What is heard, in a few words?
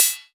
METAL